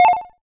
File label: system menu select back